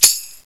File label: TAMB HIT; FAIRLIGHT CMI IIX; PERCUSN1